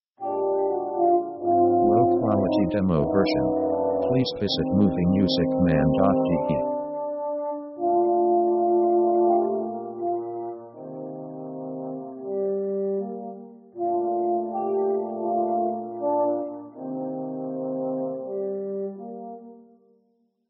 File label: jazz old swing sketch; easy themes